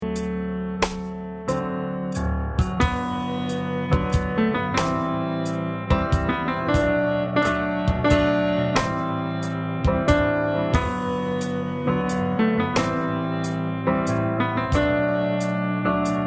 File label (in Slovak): Pharingeal slova podklad klavir